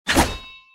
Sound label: dirk